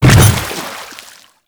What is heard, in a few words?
Hammer Boss Step Water, footsteps; Warframe; Grineer Hammer Boss